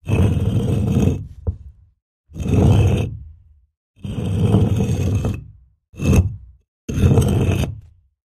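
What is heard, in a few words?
Stone Heavy Move Push X4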